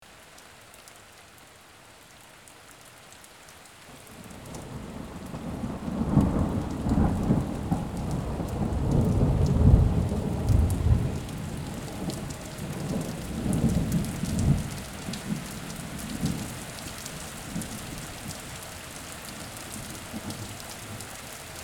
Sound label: Rain and storm; bigsoundbank